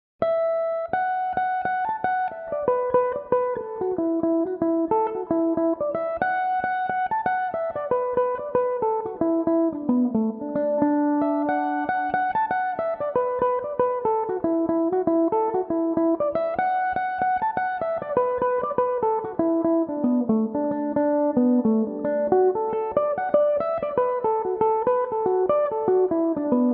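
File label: D major, Irish mandolin; The Hawthorn Hedge; Belmuse EM-190 electric mandolin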